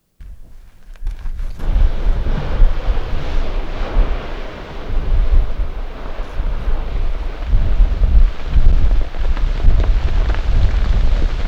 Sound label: Stromboli w splatter & wind